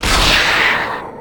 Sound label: attack; templar assassin